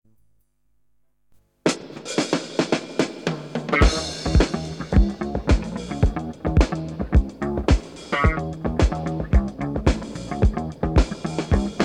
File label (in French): Funk